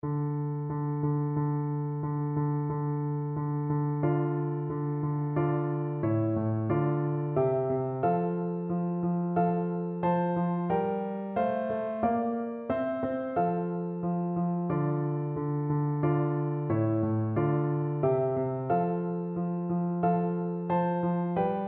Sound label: pavane, piano; capriol